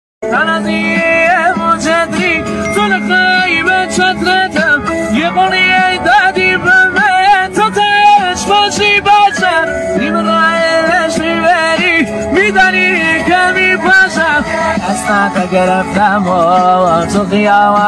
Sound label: Remix